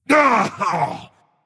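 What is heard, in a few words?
non verbal ouch, voice; cernunnos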